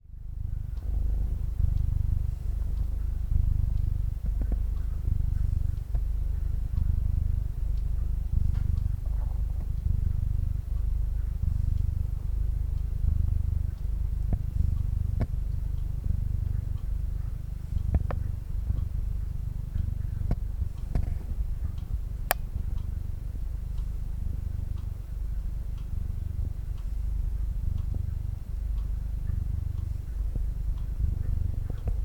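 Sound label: purring of an 18-year-old cat being affectionate; 18才のネコ（チャコ）の甘えるゴロゴロ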